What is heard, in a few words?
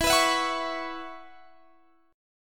Gb 7th Suspended 4th E, chord